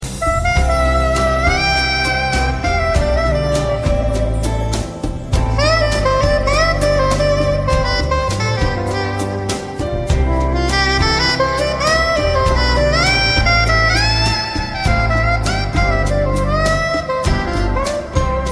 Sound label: Lullaby